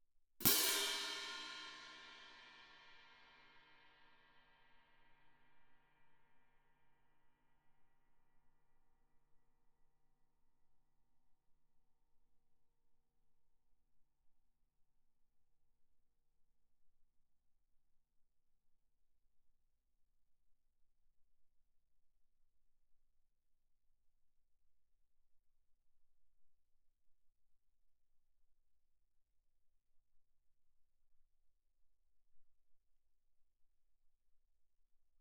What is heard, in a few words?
18 orch crash, viennese, mf, crash cymbals; Percussion